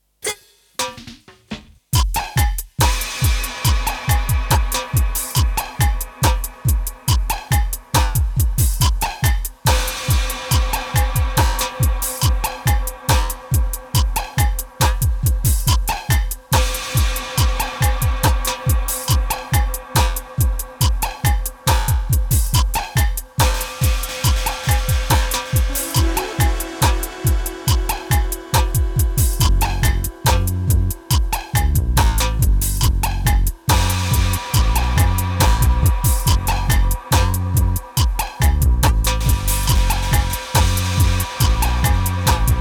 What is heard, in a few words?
appliance dub mix